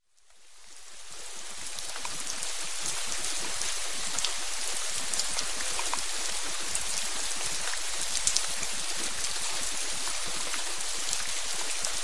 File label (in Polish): Padajacy Deszcz